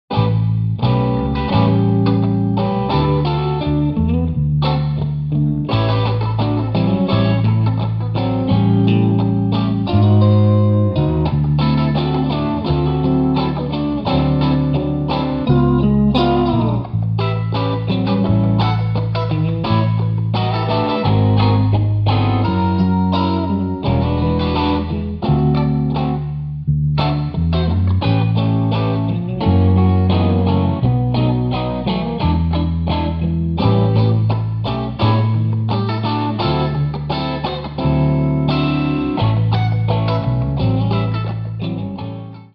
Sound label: Rhythm Git 1+2 Bass